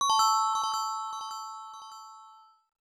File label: COIN; super space invaders 91; f2 system; arcade